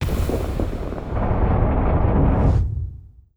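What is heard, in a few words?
Entrance Door Open; Boss Rush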